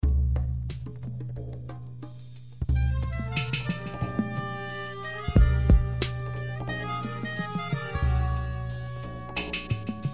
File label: Loop